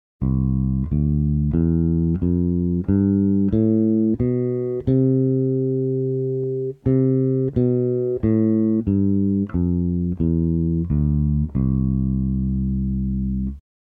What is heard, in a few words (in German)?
Dur-Tonleiter 1 Oktave, Bass